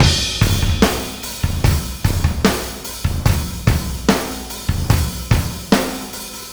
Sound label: Chorus, Full Mix, Drums; Sonic Foundry (Sony Creative Software); Drums from the Big Room The Mixes; Demo Projects